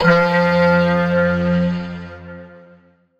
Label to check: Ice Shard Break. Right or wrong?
Wrong